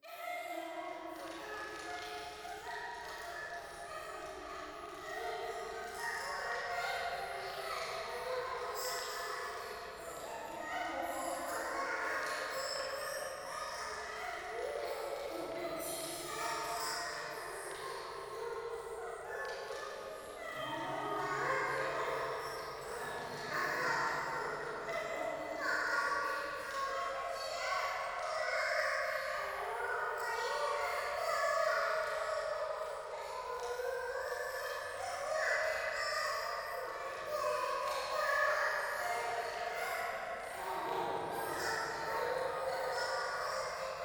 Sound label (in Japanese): 廃墟 喘ぎ 暖炉